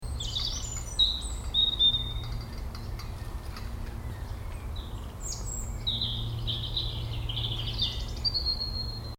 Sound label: park loop